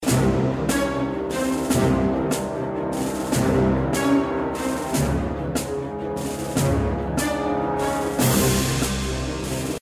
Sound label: Battle Tense Music; Zak Storm Super Pirate; mobile